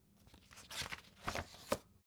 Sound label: folder page turning soft